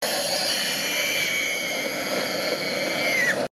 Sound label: screaming